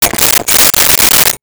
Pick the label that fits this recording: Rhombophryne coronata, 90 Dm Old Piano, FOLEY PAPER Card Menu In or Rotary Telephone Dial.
Rotary Telephone Dial